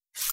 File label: knife